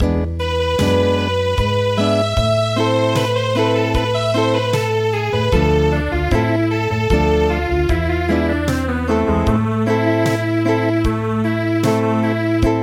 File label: Acoustic